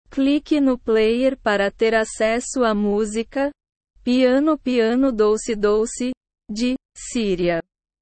piano piano dolce dolce

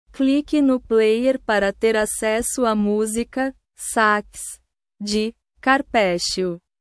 sax

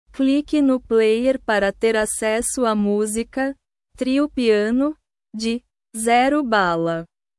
trio piano

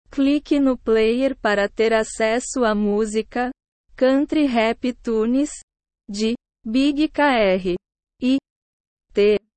country rap tunes

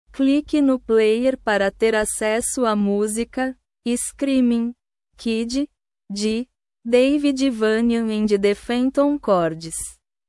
screamin kid

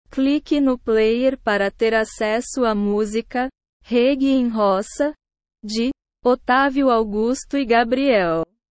reggae in roca

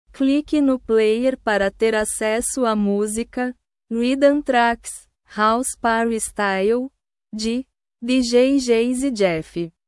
rhythm trax house party style

